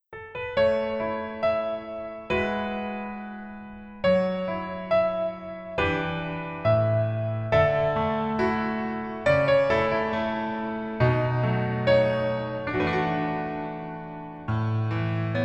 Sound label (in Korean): Piano ver, 멜로디MR